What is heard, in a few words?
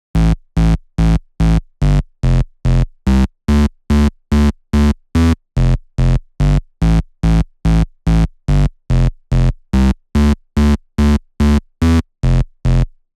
144 BPM Chorus - Bass; Vengeance Essential Clubsounds Vol.5; VEC5 Demo Constr Kits; Flight Kit; Root Amin; Bassline